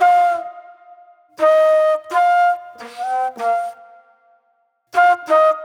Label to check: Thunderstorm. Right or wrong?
Wrong